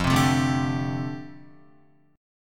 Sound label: F 6th Add 9th F down Guitar, chord